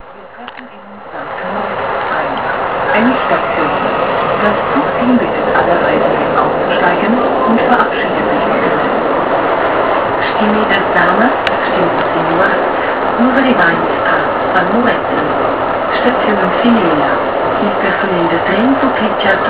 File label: schweiz, bus